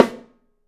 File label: Studio Snare